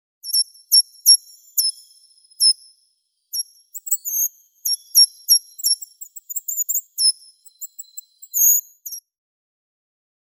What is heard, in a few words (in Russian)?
korotkopalaja pischuha